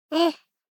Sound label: BABIES TALK Boy 12 months Huh; Baby Boom